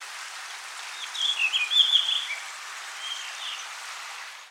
Blue Rock Thrush, Blaumerle

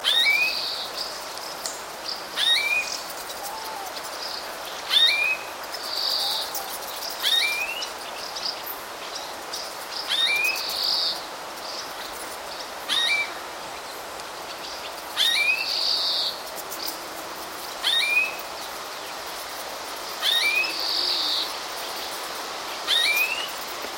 European Greenfinch, Grünfink

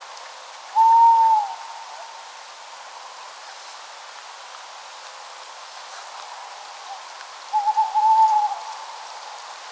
Tawny Owl, Waldkauz

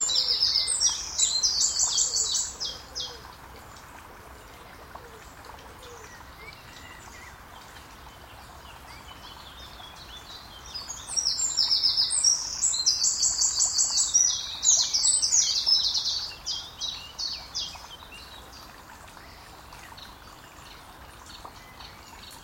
Eurasian Wren, Zaun Koenig

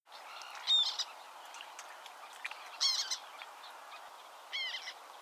Black Tern, Trauerseeschwalbe

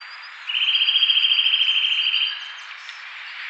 Eurasian Nuthatch, Kleiber

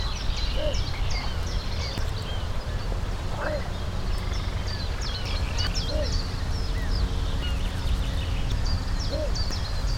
Little Bittern, Zwergdommel

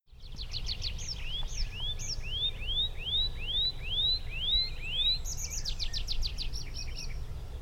Tree Pipit, Baumpieper Anthus trivialis

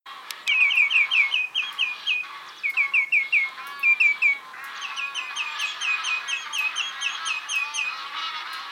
Common Greenshank, Grünschenkel

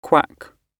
quack

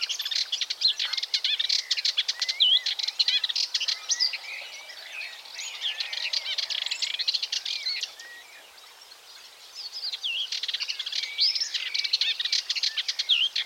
Sardinian Warbler, Samtkopf-Grasmücke

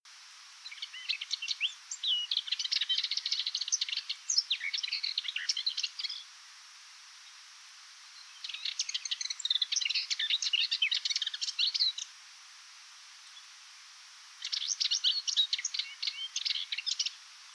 Subalpine Warbler - song, Weißbart-Grasmücke

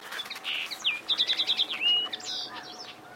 Common Linnet, Bluthaenfling

